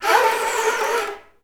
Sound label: FL FX; Best Service; Peter Siedlaczek's Advanced Orchestra